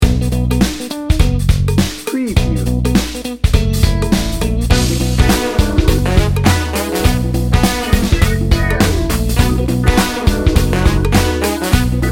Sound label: funk n brass